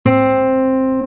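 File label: acoustic guitar; TamTamMicro.activity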